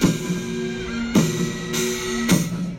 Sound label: K Band, Korean Band; Korean Band 2"; Infinity Room